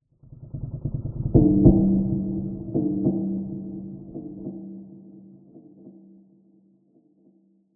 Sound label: stinger drum roll swell distant muted low into clunk x2 metallic; sound design; Skyclad Sound